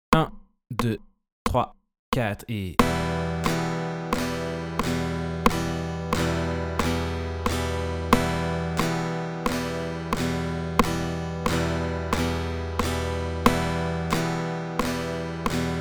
Flèches vers le bas 150

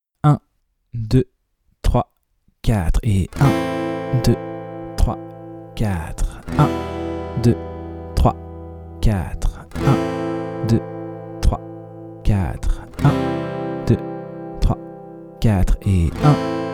moyen Jouer la grille; De 0 à vos premières suites d'accords

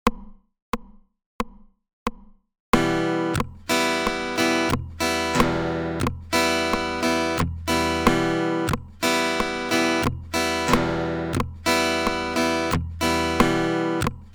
Percussions rythmique classique 90

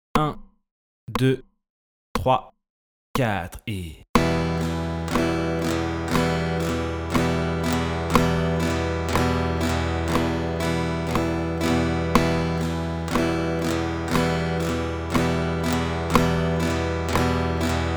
Flèches vers le bas et le haut

